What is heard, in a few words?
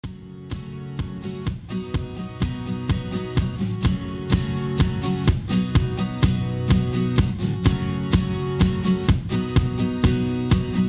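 Hoedown Instrumental with background vocals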